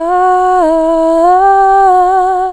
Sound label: aahhaa, Chants; Vocals